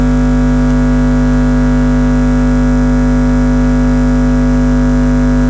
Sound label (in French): Son Parasite Mains Brum 50Hz; alimentation et filtres